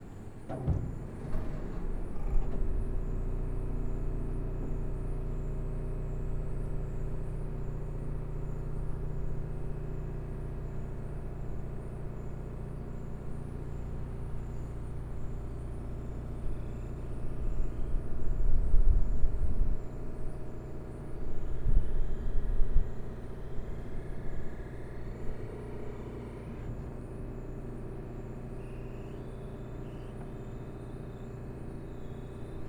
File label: paranal at dome opening; Paranal Auxiliary Telescopes (ATs); stereo; soundscape